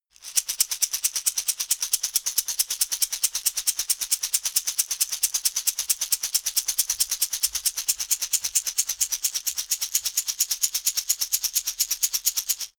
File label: shaker wood rattle slow hard